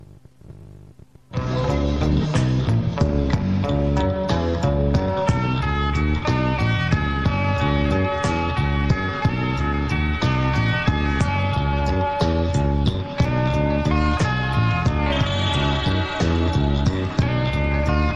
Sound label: Sad Bass